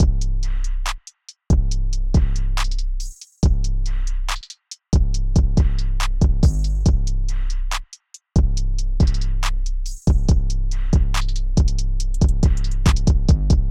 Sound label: Type Beat Drums